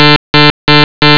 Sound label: Buz alarm; Blob